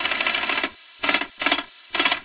wheelguns

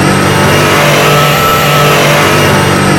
in CMED, F1; Mercedes; AOS F1 2022

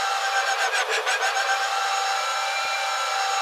TRANS OFF LOW 4000 RPM; AOS F1 2022

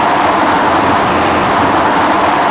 road scrub wet, Tires; Kart; AOS RF1 Kart Demo

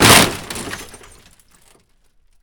impact car medium; Kart; AOS RF1 Kart Demo

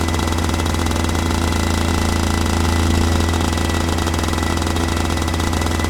KART EX OFF VERY LOW, kart

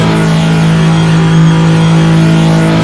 IN ON LOW, F1; AOS F1 2022; FSR2018

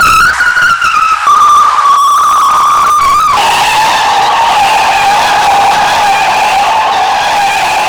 skid dry in, Tires; Kart; AOS RF1 Kart Demo

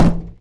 impact cone; Kart

